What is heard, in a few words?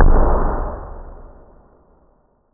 Big Exp Int